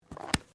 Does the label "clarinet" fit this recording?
No